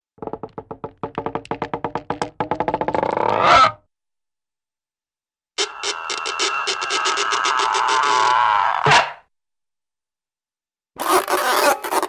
Long Slow Strain stretch w-release; Hollywood Edge Cartoon Trax

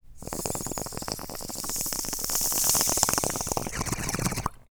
Bong Hit One Quick Inhale CU Bubbles; Foley; Foley Essentials; Foley - Misc

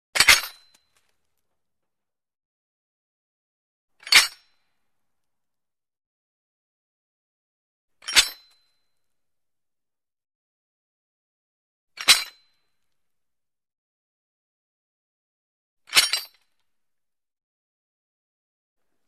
Metal Animal Traps Snap; Hollywood Edge Foley Sound Library

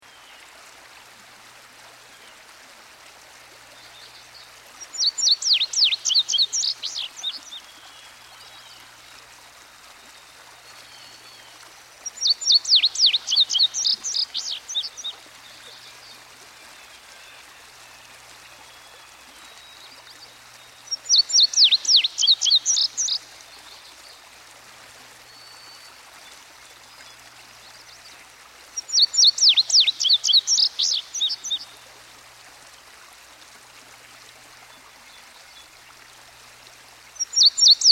Indigo Bunting, Songbirds By The Stream; Dan Gibson

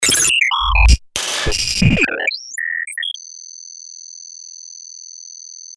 Ricochet; Best Of N2O Library; Stabs & Bursts

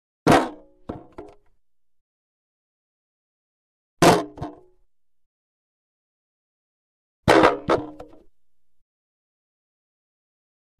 Wood Shield Falls Wooden Shield Fall To Ground; Hollywood Edge Foley Sound Library

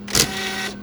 type; 1000fx; OFFICE

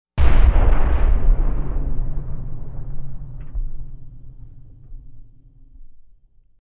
Explosion - Big and distant, with long reverb; Hollywood Edge Sound Designers Tool Kit

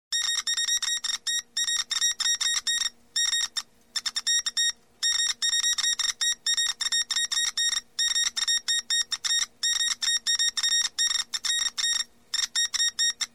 Morse Code Transmission; SOUND EFFECTS1

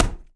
WOOD, SMASHING; 1000fx

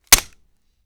Gun Hit Face Pistol Whip Smack; Foley - Props; Foley Essentials

